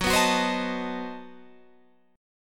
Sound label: B 7th Flat 9th, guitar